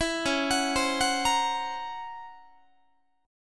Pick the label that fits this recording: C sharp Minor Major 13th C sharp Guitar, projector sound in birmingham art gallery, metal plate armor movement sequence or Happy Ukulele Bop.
C sharp Minor Major 13th C sharp Guitar